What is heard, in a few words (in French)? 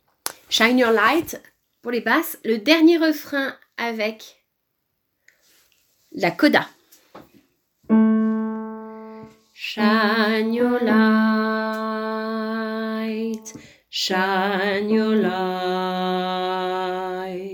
dernier refrain basse